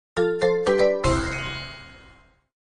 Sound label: success